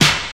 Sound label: sparkle snare sound D key; snare drum samples